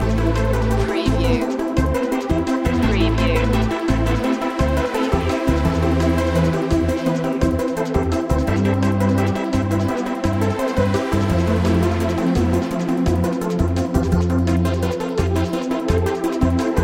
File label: chill loop